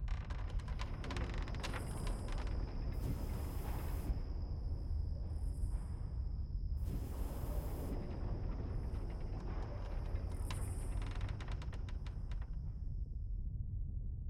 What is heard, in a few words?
ambience mine; Ben 10 - Omnicode